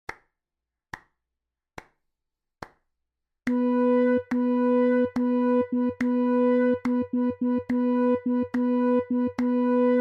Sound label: Rhythm Practice